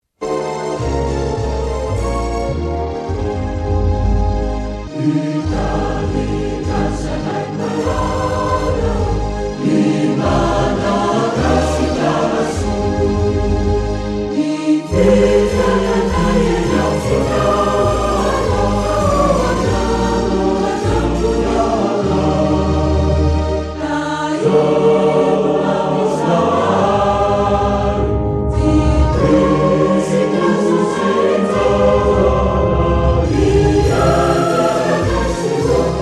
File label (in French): Hymne Malgache Version chantée